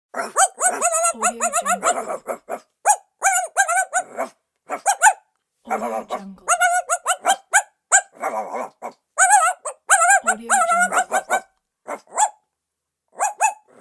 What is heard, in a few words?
cartoon dogs barking